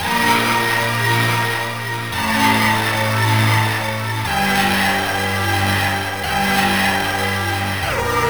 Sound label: Synth Distorted; Electrocution